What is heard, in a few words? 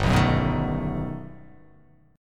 G Augmented Major 9th